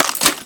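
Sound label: lewis magazine in; weapons; ww2; sven; ww2projekt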